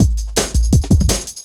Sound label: Ezee Rolla Cut, Drum Loops; Zero-G Jungle Warfare 1, 2 & 3